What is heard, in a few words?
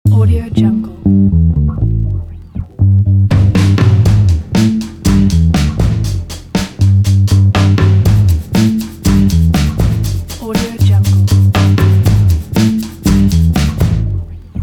retro funky logo; sviridpavlov